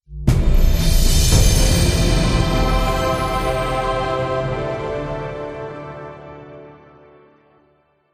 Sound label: jackpot win; Recode; jewel Quest Riches Desktop